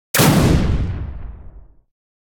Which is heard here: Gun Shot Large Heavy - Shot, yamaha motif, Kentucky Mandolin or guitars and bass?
Gun Shot Large Heavy - Shot